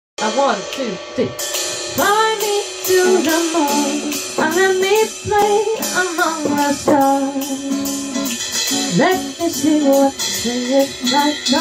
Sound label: latin jazz band